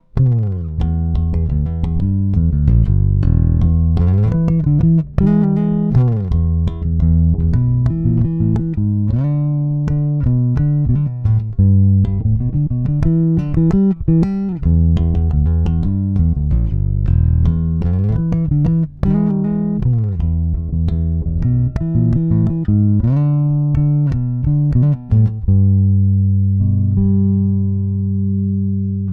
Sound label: Neck Active Rock